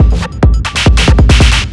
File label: drum 138, drum-loops, loop-grinder, Techno